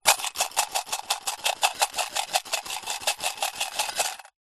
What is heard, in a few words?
foley money coins in metal tin shake; zapsplat